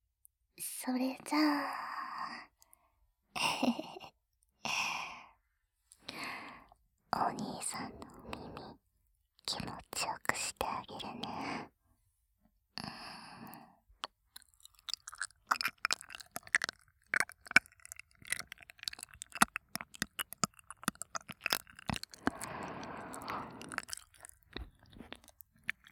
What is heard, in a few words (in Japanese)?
ラブラブ耳舐め, ASMR; 効果音なし; ルリちゃん